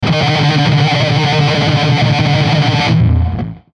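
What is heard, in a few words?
Musical Element, Drum Snare March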